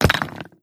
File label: stone impact